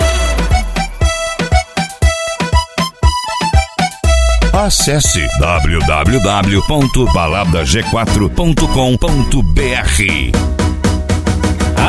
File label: sertanejo; balada g4